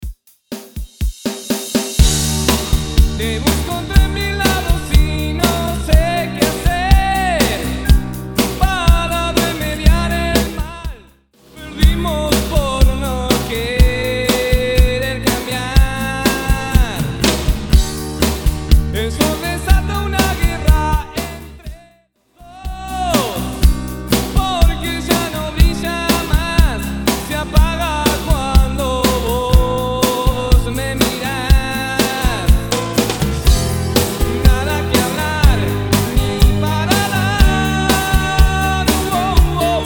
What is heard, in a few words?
Intro Outro 122 BPM